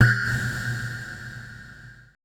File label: AMBI FX